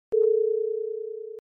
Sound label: sine Mod 440